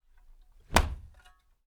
body hits forceful; prosoundfx

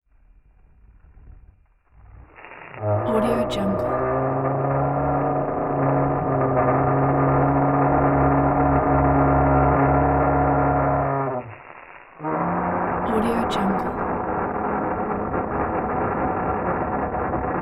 metal scrape

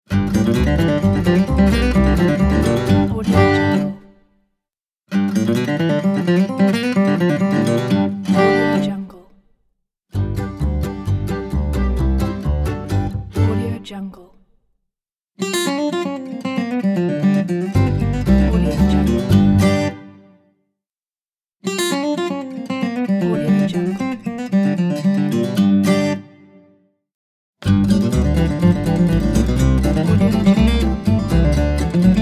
upbeat country acoustic logo pack

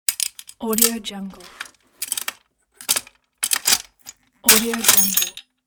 gumball machine

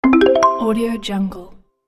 marimba notification

